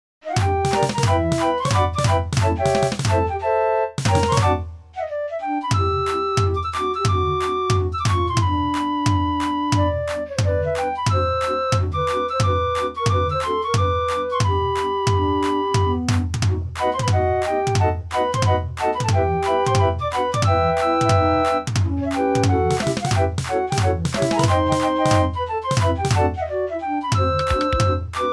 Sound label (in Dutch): draaiorgels